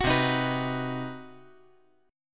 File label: C Minor Major 7th Flat 5th chord